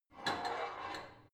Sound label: barbell heavy plate pull out